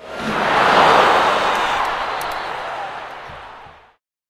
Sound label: bumper car boo; Source Engine; tf